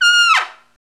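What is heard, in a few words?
Trumpet Fall, Roland; Club-50 - Foundations Roland; Trumpets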